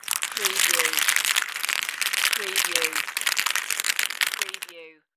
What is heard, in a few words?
Nuts Pour Set Down